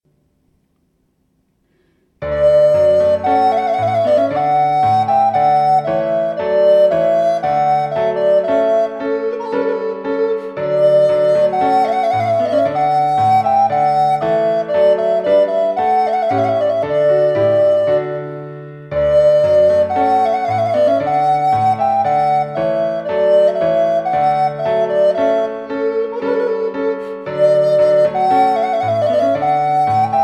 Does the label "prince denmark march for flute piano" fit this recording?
Yes